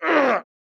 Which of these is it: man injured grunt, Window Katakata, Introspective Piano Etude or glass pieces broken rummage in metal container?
man injured grunt